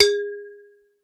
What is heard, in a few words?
Bottle Tap; Bottles; Bottle Struck